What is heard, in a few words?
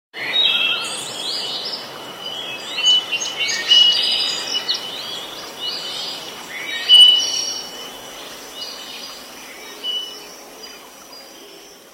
forest birds